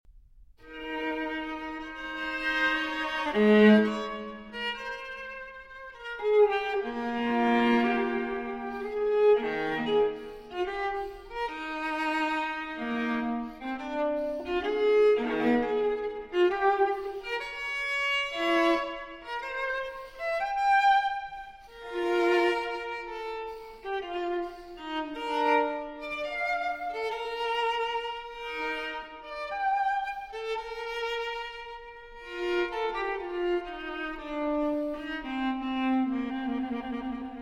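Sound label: Cello Suite No. 4 in E-Flat Major Transcription For Viola Sarabande; J.S. Bach; BWV 1010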